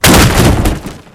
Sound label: wood crate break, physics